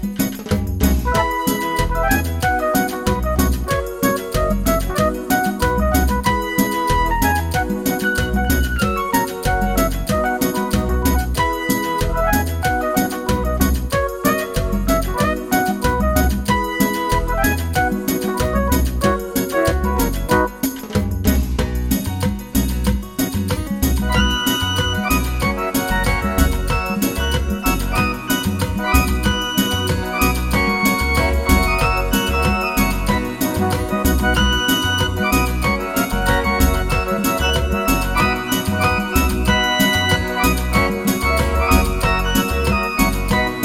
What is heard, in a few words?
Cumbia